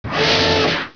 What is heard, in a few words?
servo; tnpdemo